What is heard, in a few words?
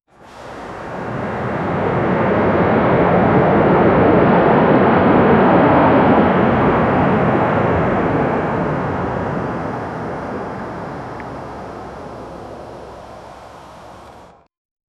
fpv near flight, flight